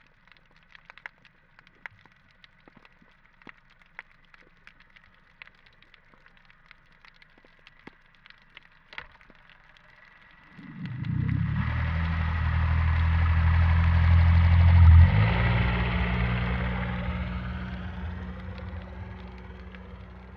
Boat Passage, Underwater; Trieste; B-format

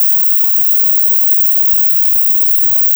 INV MLS; Teatro dell'Aquila

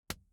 ir front right ambix; Impulse Responses; Hyundai

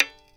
KLOTZ, Violins

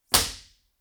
impulse response; Corso acustica

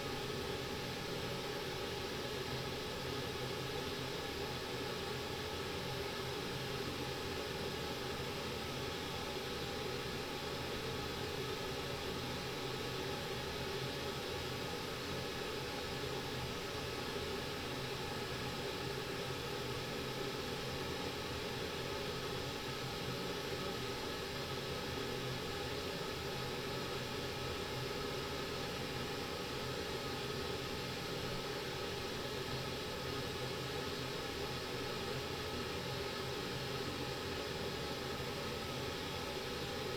Pink Noise; Corso acustica

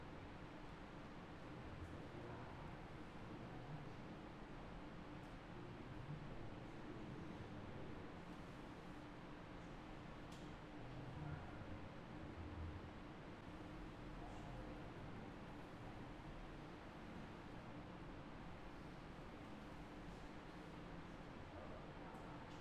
Back Noise

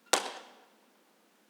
IR clappatore; Teatro Benevento

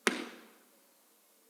IR sweep

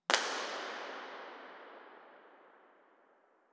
IR sweep; IMP-RESP

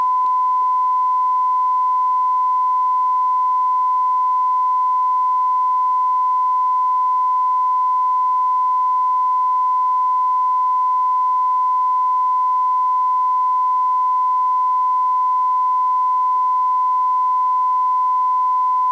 Calibration 94dB

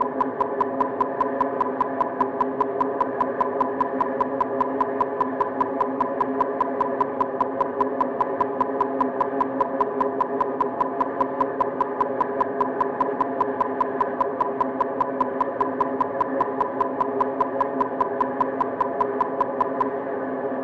burst 16 ms; Da Sorg1 a Sorg2; LaSpezia; CESI

